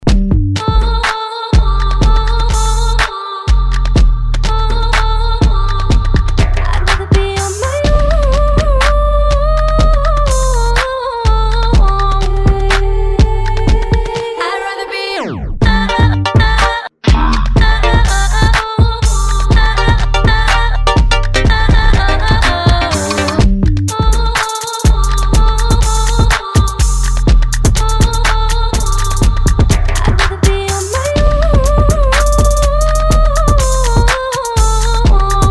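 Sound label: female trap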